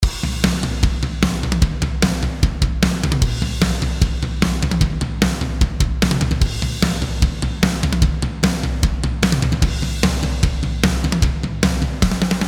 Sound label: Hard Rock Tom Groove 1 - Processed Wet, drums